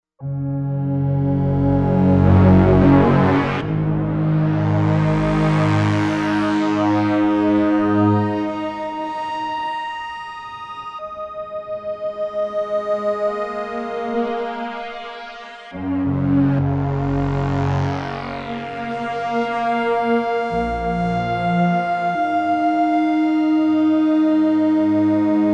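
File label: pad Deep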